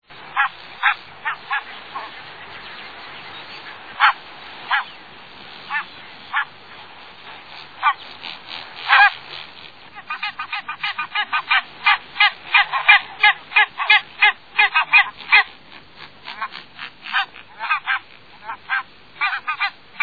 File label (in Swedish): vitkindad gås